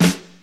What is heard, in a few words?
smooth snare sound f key; snare drum samples